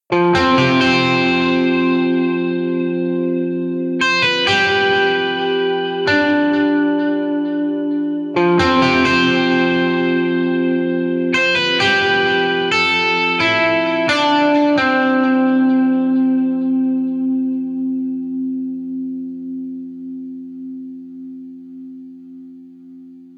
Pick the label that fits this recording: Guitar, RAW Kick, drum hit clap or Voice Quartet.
Guitar